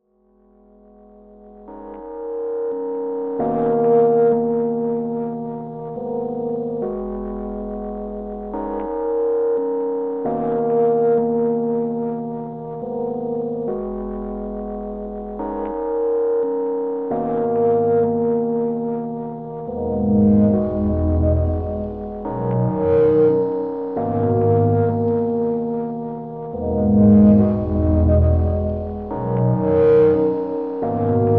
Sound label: Rhodes Ambient Piano Loop Ambiance 140 Bpm; Rhodes Life